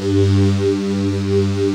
STRING SOFTS; Pro-Rec - Synthsonic; Z1 PADS

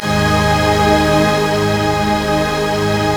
PADY CHORD; B.BEAT

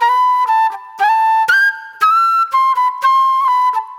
Soul Plate Flute

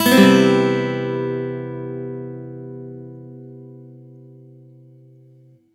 C maj7 upstroke, Acoustic Guitar; Sonic Foundry (Sony Creative Software); Songwriter Acoustic Guitar Companion; One Shot Chords

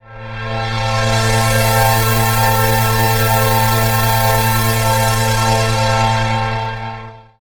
Sequential Circuit, Pads; Sonic Foundry (Sony Creative Software)

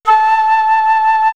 Flute; X-Static Goldmine; backtothe70's

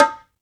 Latin Drums, E-MU Pro-Cussion; drum machine

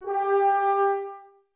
brass, Woodwind Brass Ensemble

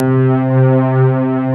BIG PAD C4; East West; Global Sounds; MKS

